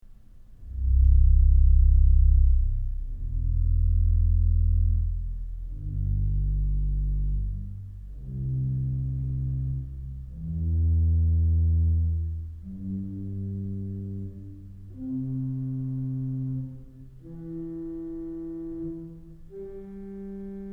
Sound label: Contra Basse; Kellogg Auditorium